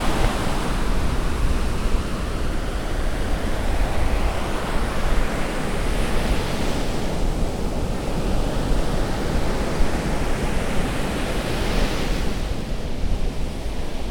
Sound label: Tsunami beach